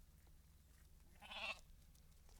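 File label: sheep eat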